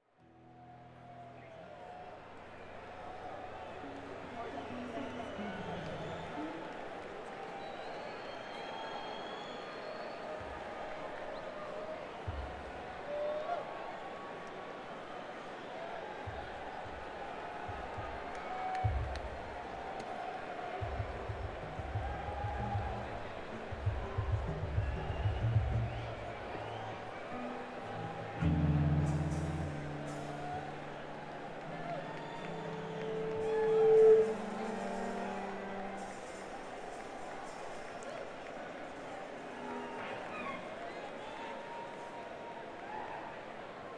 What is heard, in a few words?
Crowd; Las Vegas, NV - MGM Grand Garden Arena